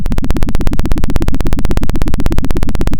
Sound of a helicopter

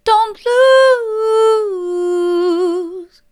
don't lose mid, vocal

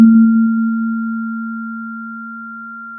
Sound of a church bell

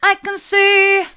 i can see mid, vocal

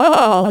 surprise moderate; VIVAE

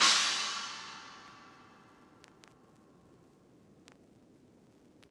Vinyl China

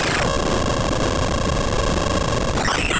Sound of a cat meowing

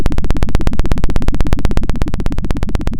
pop, Sound of a helicopter

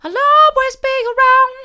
i'll allways be around soft, vocal

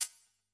Pd Hat, drum

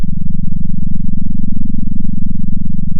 center, sound of a small motorcycle

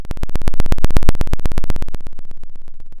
Sound of a plane taking off

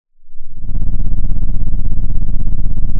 Sound of an engine revving

